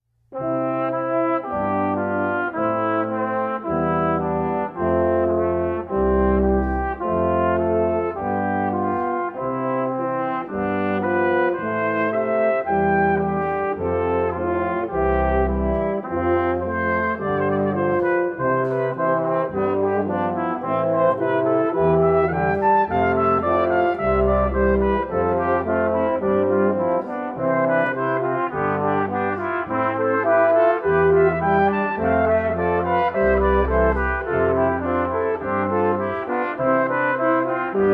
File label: Brass Quintet Canon; Johann Pachelbel